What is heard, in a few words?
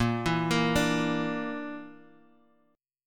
A sharp Major Flat 5th, Guitar, Half Step Down